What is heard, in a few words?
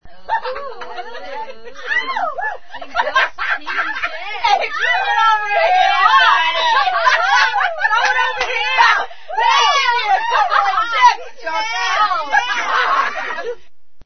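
PARTY LAUGH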